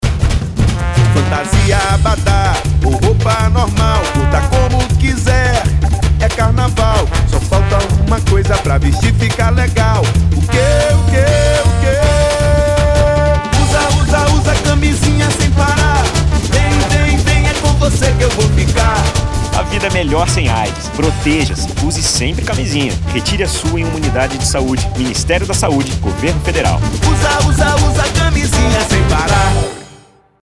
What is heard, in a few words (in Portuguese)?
jingle axe; min da saude